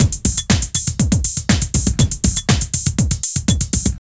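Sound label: drum loops, right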